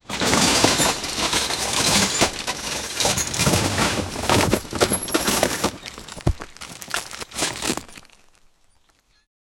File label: cart derailing in forest; derailment